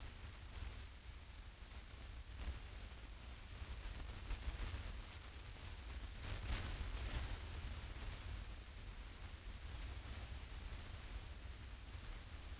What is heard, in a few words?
noise